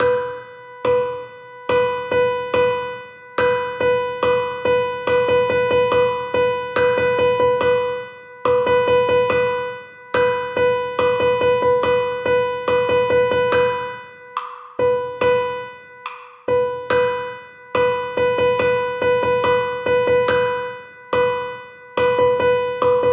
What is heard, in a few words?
Rhythm Exercise